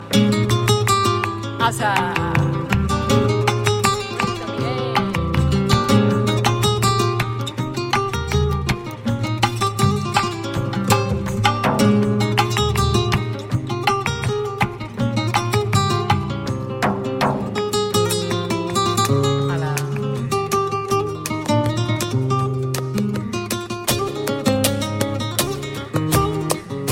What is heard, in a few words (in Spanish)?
Tangos falseta, flamenco